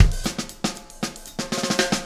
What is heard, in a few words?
Beat, Loops